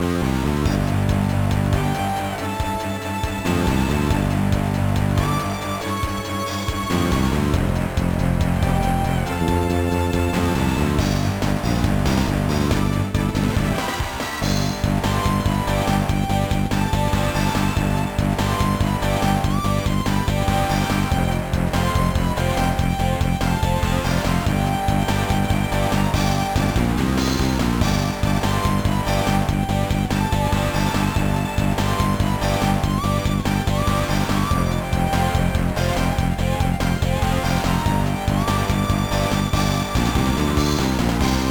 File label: BGM